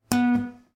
instrument strum

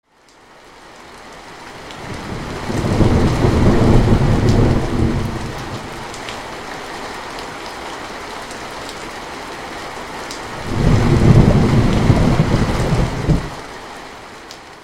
rain and thunder

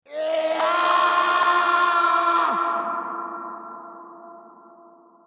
eaaaaaaaahh

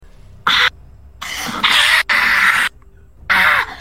screaming

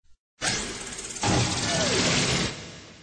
pokeball sound effects; pokeball comeback

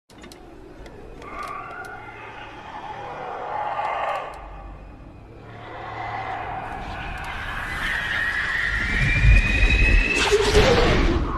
night fury sound; httyd

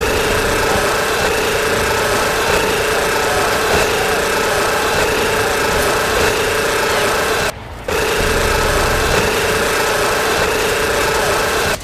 1200 rpm sound effect

